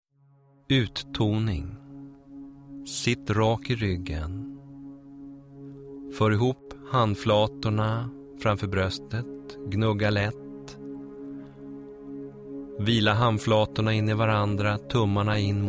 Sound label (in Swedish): Uttoning